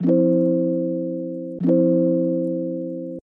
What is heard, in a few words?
office alarm notification